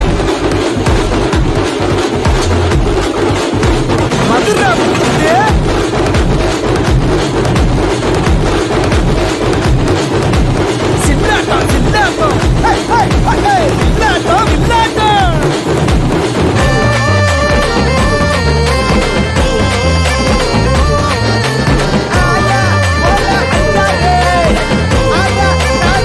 Drum Beats BGM; Jagame Thandhiram; Tamil

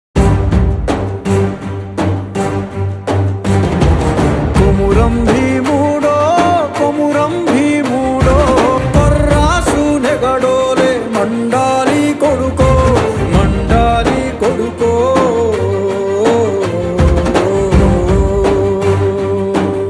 Ringtone Bgm; RRR; Telugu

